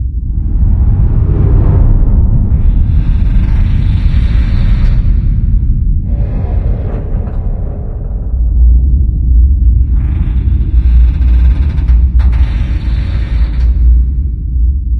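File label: ambience big shaft; dm cavernous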